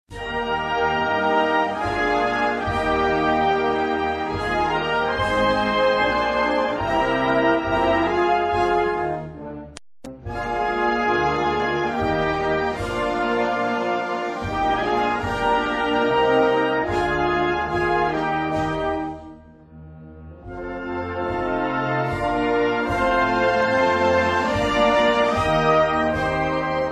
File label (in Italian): Inno Nazionale Austriaco; W A MOZART